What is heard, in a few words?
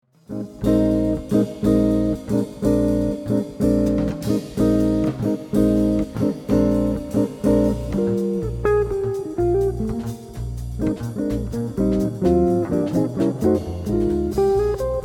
Fast Jazz, Guitar